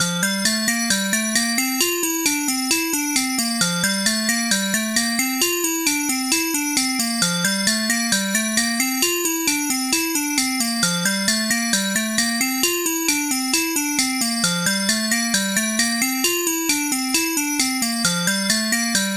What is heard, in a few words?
suspense